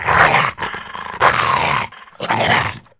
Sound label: dog; Mafie 1